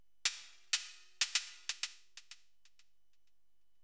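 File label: percussion, house, 125, drum; eJay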